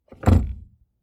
clutch pedal release, Car Interiors; Sounds Great

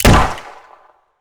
gun revolver pistol shot; Gamemaster Audio; Gun Sound Pack

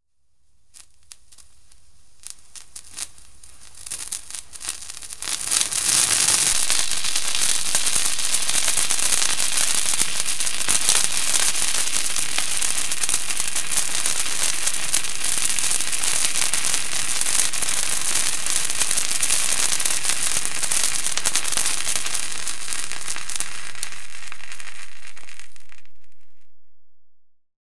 Fireworks, Crackerjeck Fountains; BBC Sound Effects Library; Explosions Guns Alarms

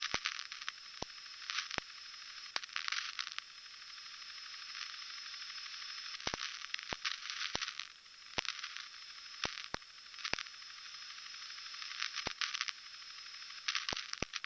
Crackles And Pops, Shortwave

